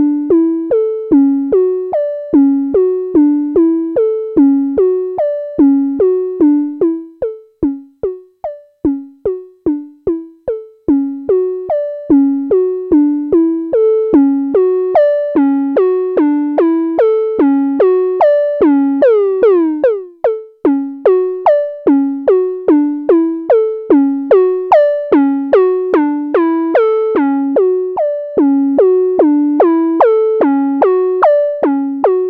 Melody

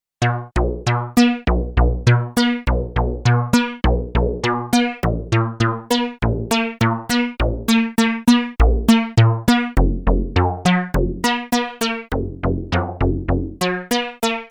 arp reso Bass

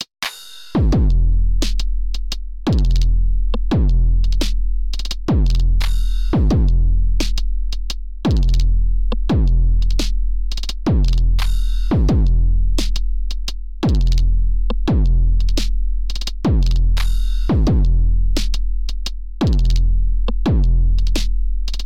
internal Distortion; Metric Halo v4; Drumazone2

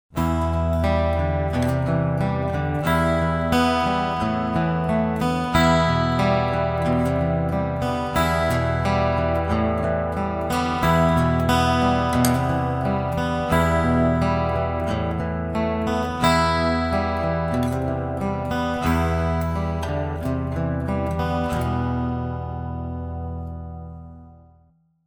Chorus Hall

